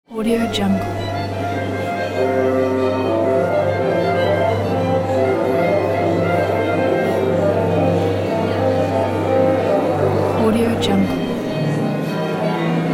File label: orchestra tuning; blackriverphonogram